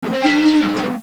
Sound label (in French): echec; MOBICLIC 13; quiz